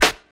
airy sizzle acoustic snare f key, steel snare drum sample tuned to the F note; snare drum samples